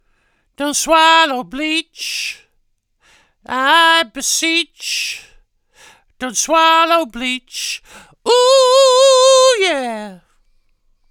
Vocals Boost 4 bei 8 kHz

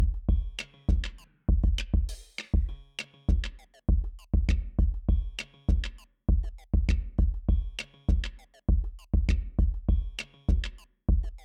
Drums original; cre8audio West Pest

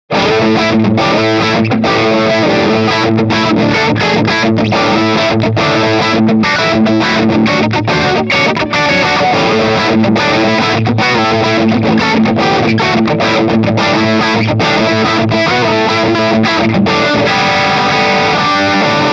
Medium Dist Riff

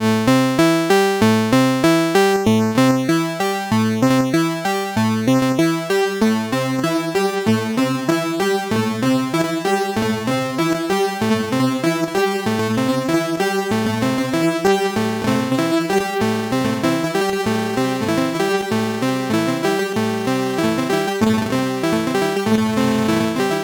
Bastl Thyme Coarse Stereo Robot LFO Tri

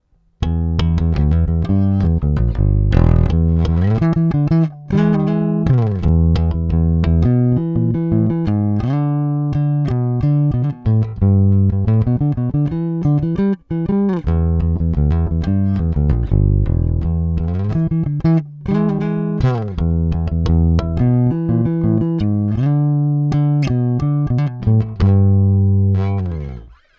Preci DI Pre

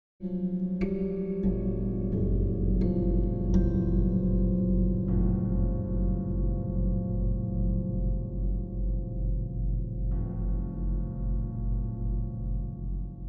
Mysterious Atmoshpre

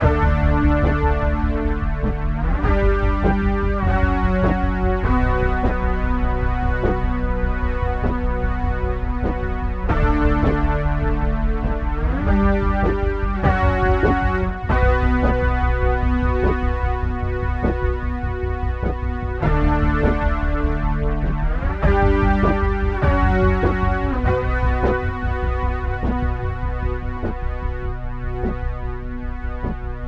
Boss SY-1000 Preset

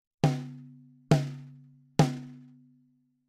Snare NT3 vintage steel; Warm Audio TB12

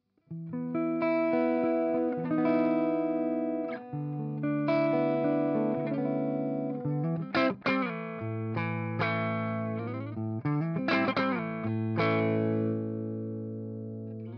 Fryette clean

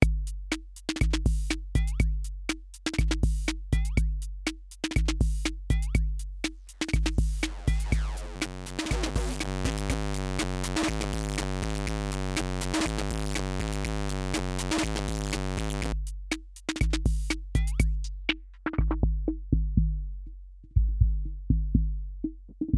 Drum Machine; Ottobit

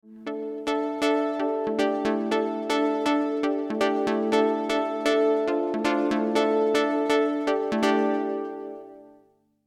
jet reverb

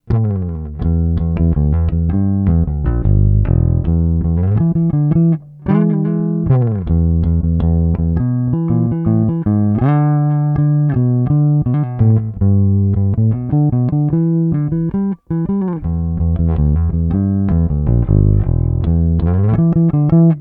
Rock finger

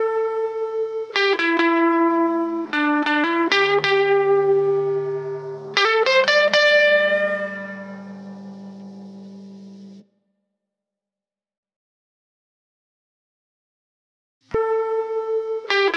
GIT taming

